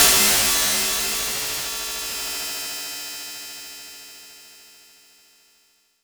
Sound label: Noise